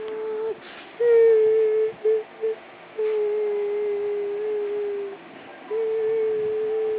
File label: baby hungry